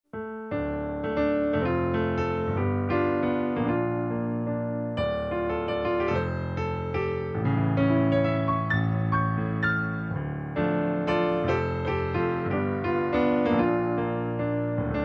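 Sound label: House of the Rising Sun Instrumental, piano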